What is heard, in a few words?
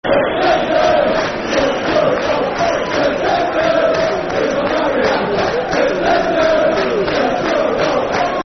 Chant Football Chant (Soccer Song); Adrian Mariappa Chant; Watford Wfc